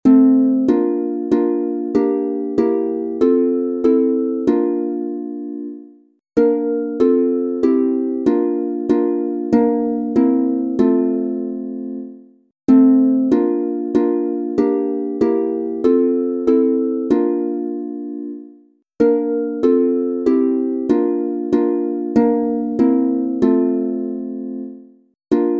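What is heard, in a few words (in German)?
F Dur 3 stimmig instrumental, Harfe; Lieder Kirchenjahr; Ihr Freunde Gottes allzugleich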